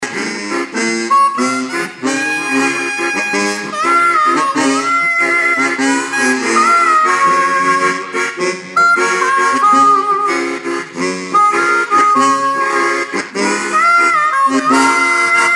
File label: Amazing Grace Harmonicas